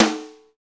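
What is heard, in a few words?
Snare, Roland; Real Snrs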